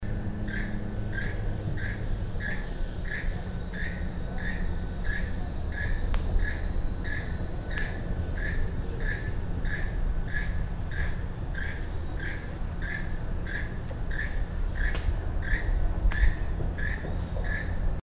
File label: Keel-billed Toucan, Panama